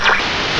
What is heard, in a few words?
TV OFF